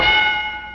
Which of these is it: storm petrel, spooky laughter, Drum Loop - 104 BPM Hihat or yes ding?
yes ding